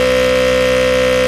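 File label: engine exhale, advanced engine sounds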